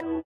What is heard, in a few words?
SITAR; Spectrasonics; Metamorphosis; INDIATEK